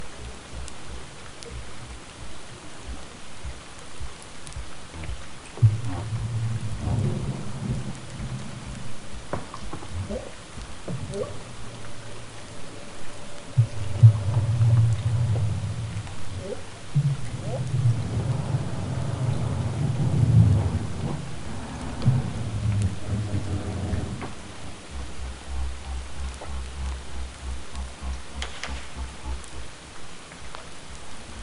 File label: vocals longer MOM CALF AMPLIFIED